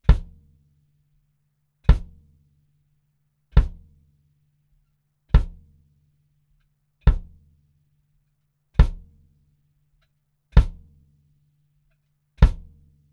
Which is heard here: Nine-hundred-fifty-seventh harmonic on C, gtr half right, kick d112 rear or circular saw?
kick d112 rear